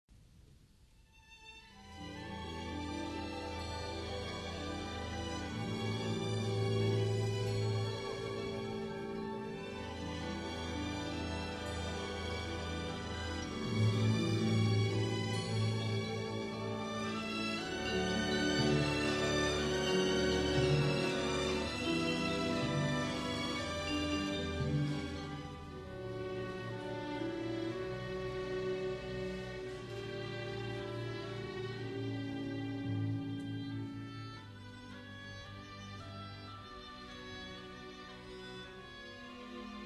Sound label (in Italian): preludio orchestrale; giordano; la cena delle beffe; atto IV